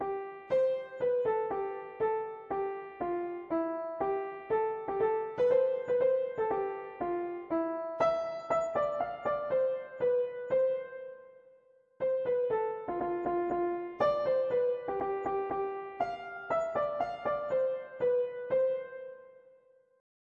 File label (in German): viola bass und geigen